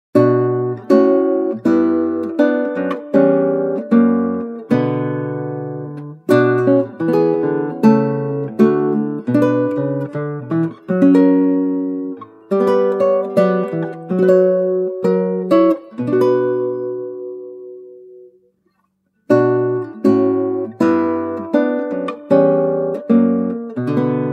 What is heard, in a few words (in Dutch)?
Klassieke Gitaar; Bach 50 Solos